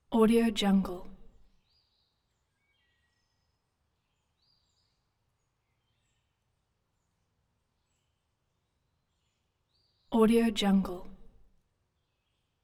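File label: ambience exterior july afternoon forest; collanderson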